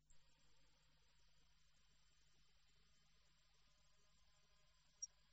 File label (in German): Schrille Toene